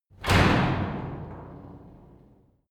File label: garage door close short hard